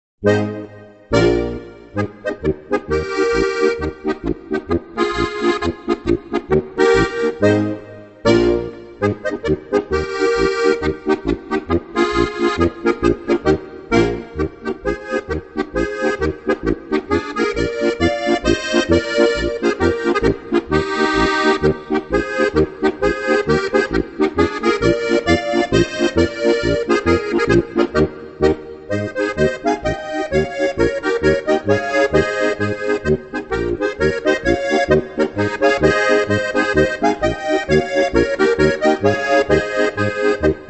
Polka

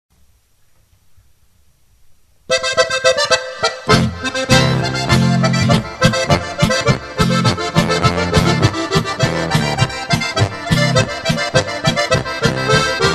boarischer, Harmonika

